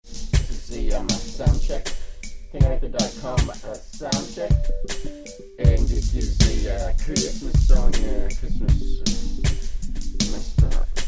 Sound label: xmas messed up soundcheck